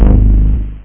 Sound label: drum thump; cephalotron2